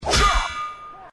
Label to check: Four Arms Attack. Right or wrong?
Right